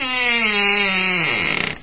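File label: creak single close sharp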